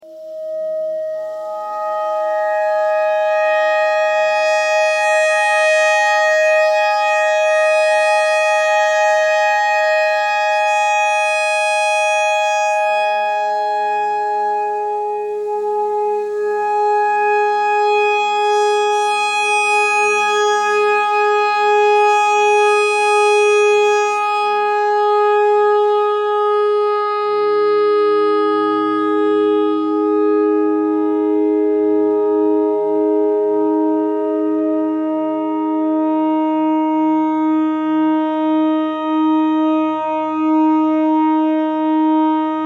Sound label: clarinet notes e clarinet notes e pentatonic